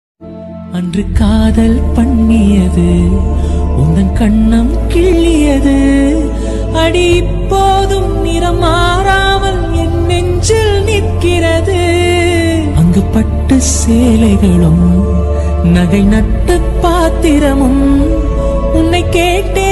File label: tamil melody songs